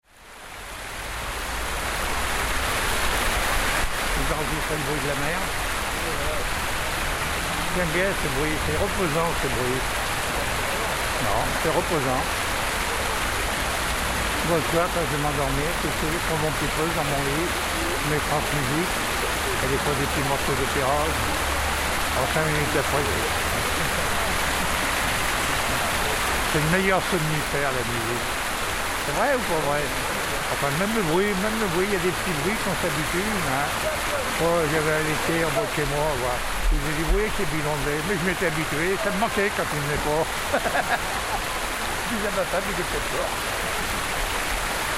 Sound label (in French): Place de la Republique